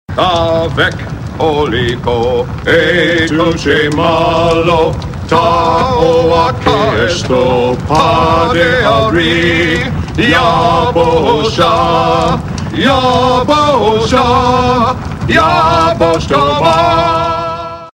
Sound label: Kal Hyah song, klingon; klingon database